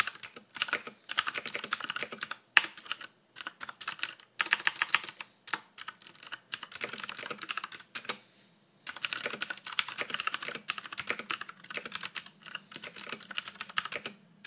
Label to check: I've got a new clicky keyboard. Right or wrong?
Right